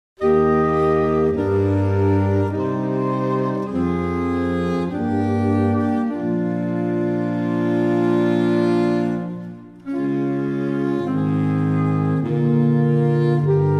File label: Saxophone Quartet